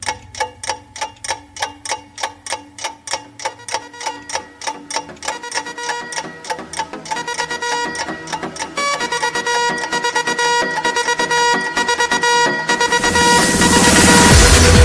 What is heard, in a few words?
Wake Up Sounds Alarm Ringtone